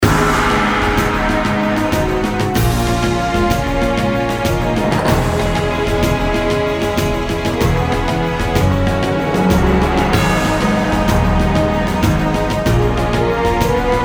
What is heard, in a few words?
Warpgate Transition